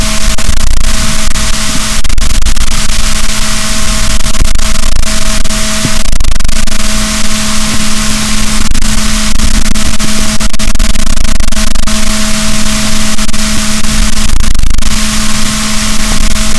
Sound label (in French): rorquals, boat, Balaenoptera Physalus; SNR 18dB